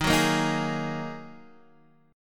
A sharp 7th Suspended 4th Sharp 5th D sharp, guitar